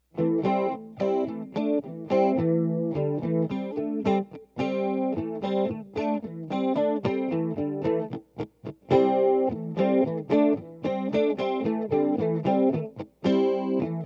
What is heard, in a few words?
chorus